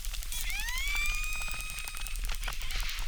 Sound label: Chirp zap, acquarium